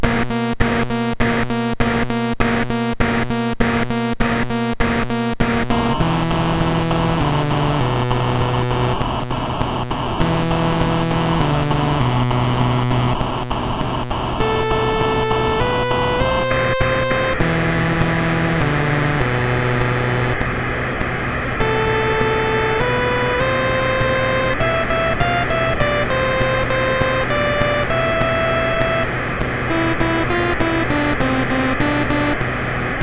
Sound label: Drums and Stuff, MOS 6581; MOS6581R4